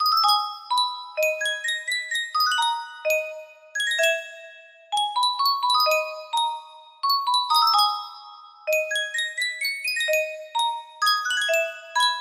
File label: Sankyo Music Box, music box melody